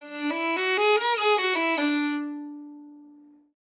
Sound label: Violin Whole Note Runs